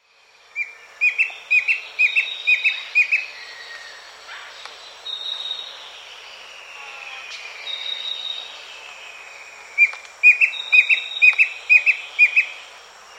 Klage's Antwren; S. America

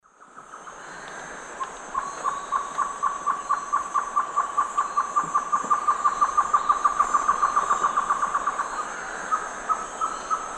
Taraba major, duet; S. America; Eastern Lowlands

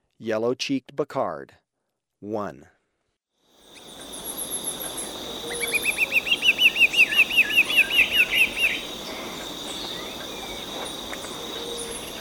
Yellow-cheeked Becard, Birds of Ecuador; S. America

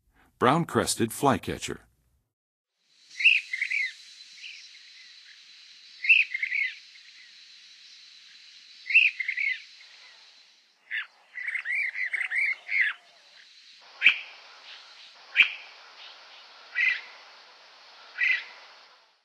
Brown-crested Flycatcher, Songs of Birds; North America; Western USA; Western USA Songbirds

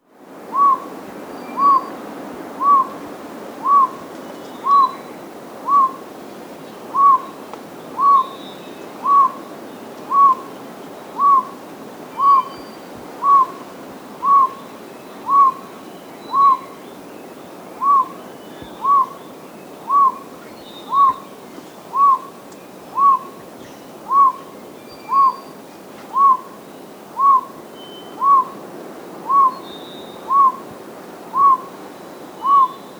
Song, Birds of the Costa Rican Cloud Forest

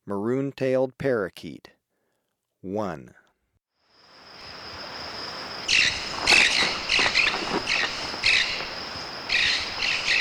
Maroon-Tailed Parakeet, Birds of Ecuador; S. America

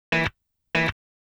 Guitar, RnB

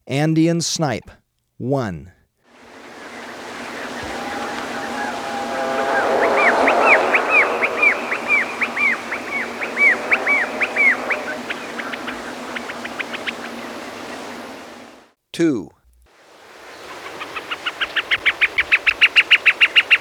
Andean Snipe, Birds of Ecuador; S. America

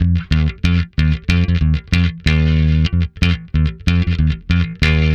Bass F Funk

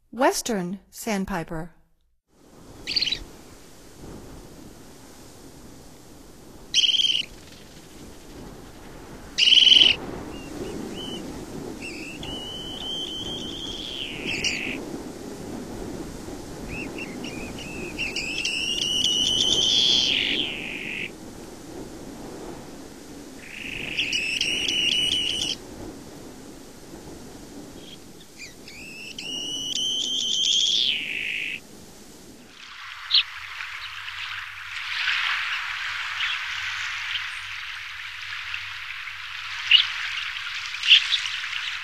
Western Sandpiper; North America; Stokes

